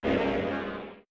Dark Monster voice hit; unsighted; enemies

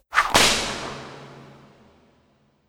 Slave Whip

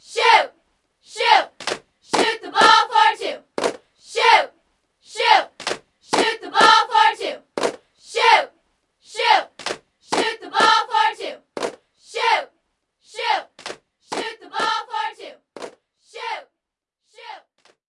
Sfx Basketball

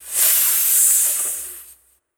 Snake

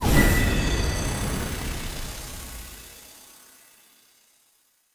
Spell Enchant Seeds

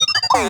Toaster; Rabbids Multiverse

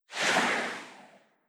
Ghost Run

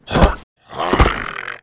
berserk boss dead

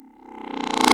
Cartoon, Warble, Cartoon Jump; Viewfinder

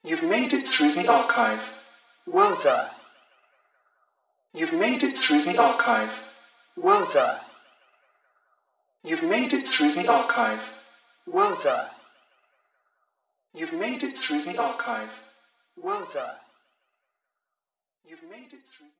Glitch

